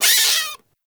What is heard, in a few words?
Forest Rodent Vocal Pain; Warframe; Characters